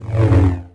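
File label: sith lightsaber Swing; weapons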